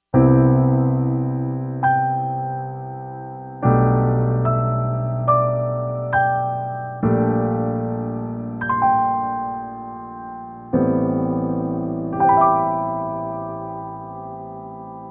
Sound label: Chimes and Ripples